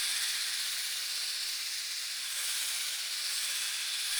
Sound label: Wisp Loop; Orlick; Friendly